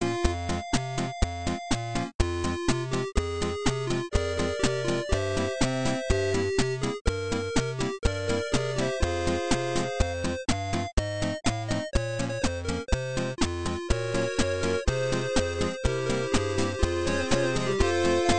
8 BIt; Katyusha

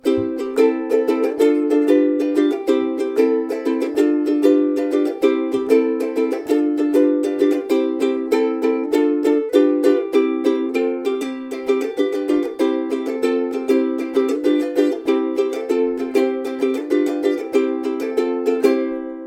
Ukulele Ringtone

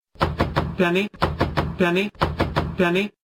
Penny Knock

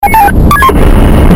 Walkie Talkie

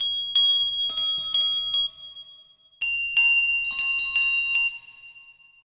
Chirpy Bells

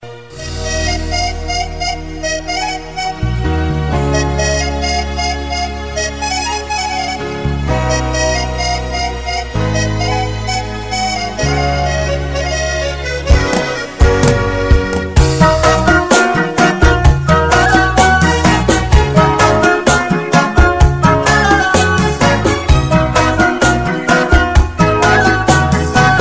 Baloch

